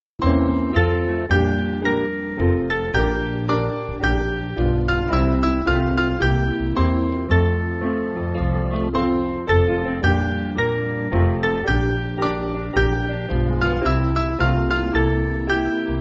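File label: Piano, Music Backing Files for Churches; Beldon; Mainly Piano (CM)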